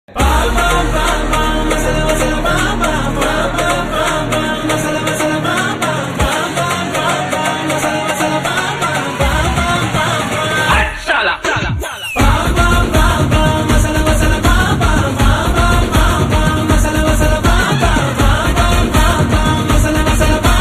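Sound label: new background music ringtone new ringtone; rangabali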